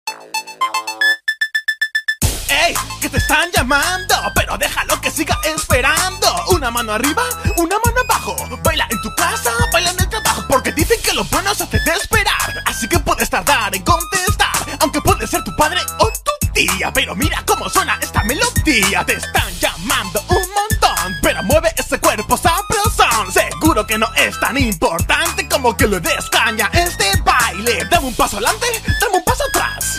tono fachero

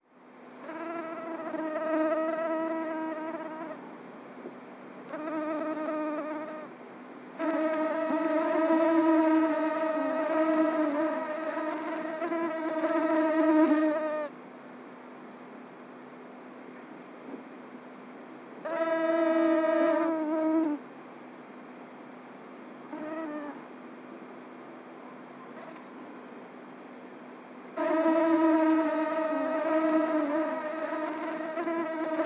efecto mosquito; tono de llamada

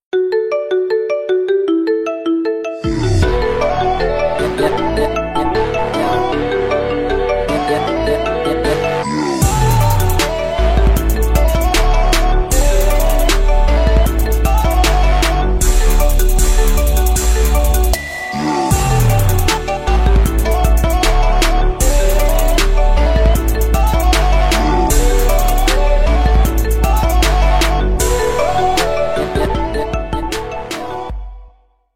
tono de llamada Xiaomi Mi 11 Ultra 5G Original

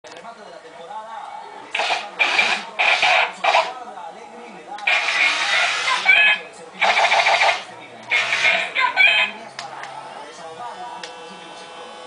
robot de juguete

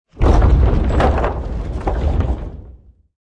derrumbe terremoto

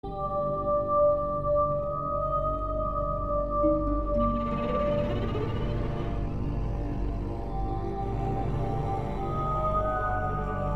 suspenso violin

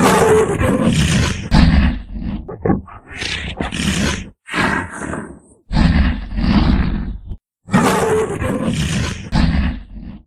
Sonidos De Leopardo

